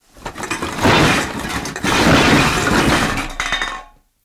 Cans and Small Containers - Processed (Tape) Junk, Metal, Aluminum Cans, Rustle, Long Tail; Double Trouble Audio; Junk and Debris